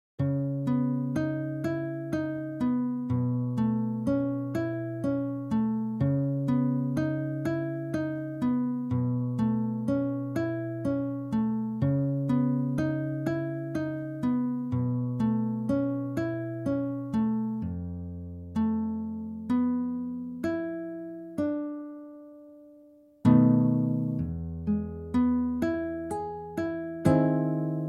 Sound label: amazing grace guitar